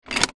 transport car plastic bottle remove from drink holder